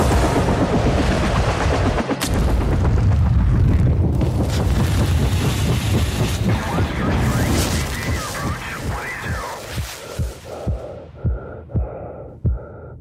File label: duet